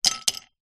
food drink ice cubes x2 place into drink glass empty